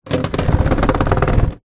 household table plastic garden drag on wooden decking